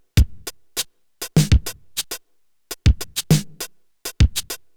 Vierviertel Pattern nur Step 5 manipuliert; IO Labs Flux MiniWorkshop

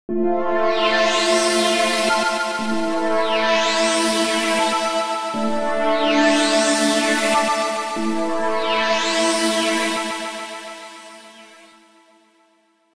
Fläche

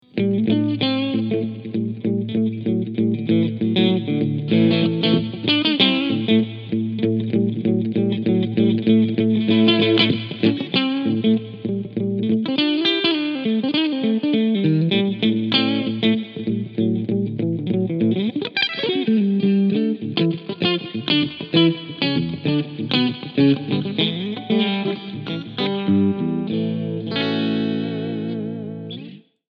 DV Mark DV Little FG 250 Gitarrenverstärker Cleansound

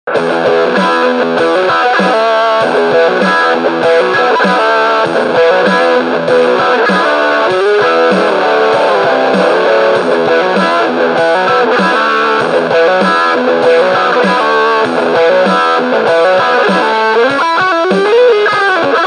Stampf Riff mit Rhythmus

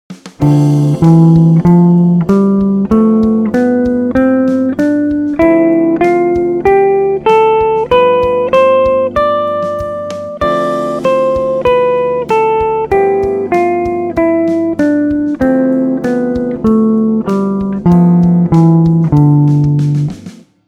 D Dorisch auf ab mit Playback

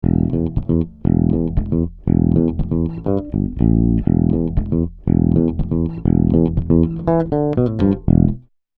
E-Bass; Tegeler Audio Vocal Leveler; ohne VL 500

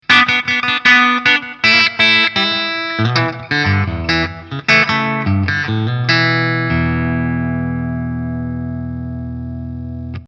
Steg bluesy

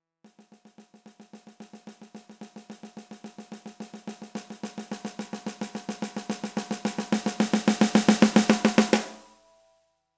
Snare Wirbel

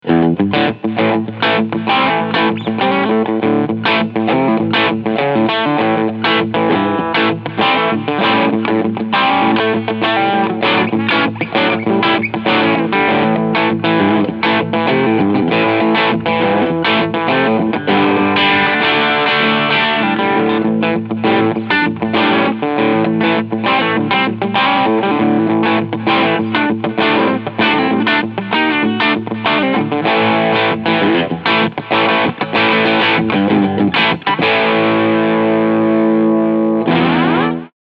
bright full ratio Tele steg singlecoil; one control golden acor

